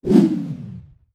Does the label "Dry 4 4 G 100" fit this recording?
No